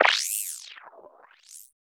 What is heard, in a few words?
boing; Spectrasonic Distorted Reality 2; BEMUSED